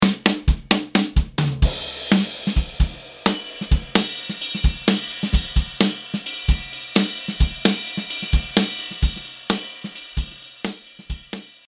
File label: drm sample, drums